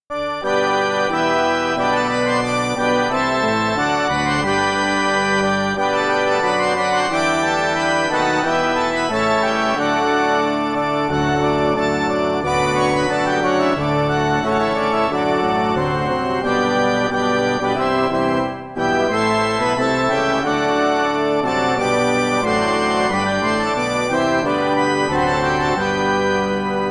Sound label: CM500 MT32; ULTIMA6